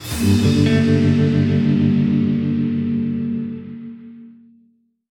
Splash Screen Confirm

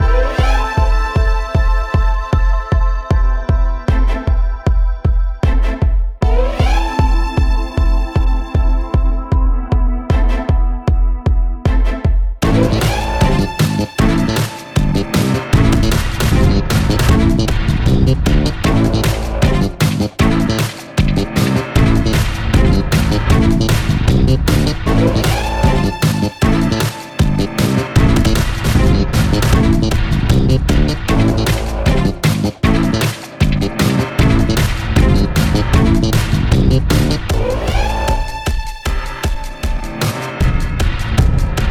Disco; the next penelope

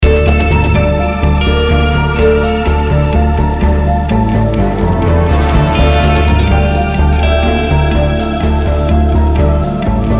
Guitartheme; Terra Invicta

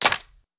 archer drop bow; Aragami

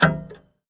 Photo selected

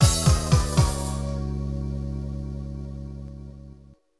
Humiliating Defeat; Rival Schools: United by Fate; arcade

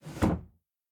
Hiding Spot Exit Door; The Inn

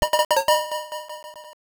COIN; Noboranka; System 1; arcade

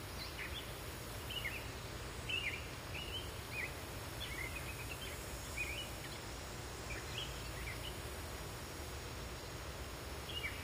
Amb Bird village Base loop

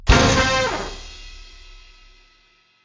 highscore; Angry Birds Space

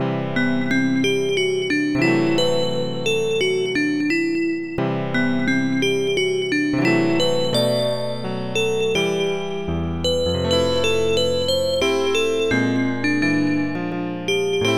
Piano; Shining Force 2 Genesis

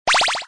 Powerup Sound

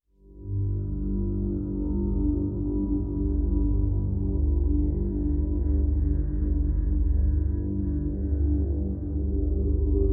Ambient; Homeworld Deserts of Kharak; The Kashar Plateau

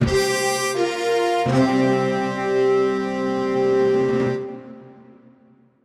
Medieval End

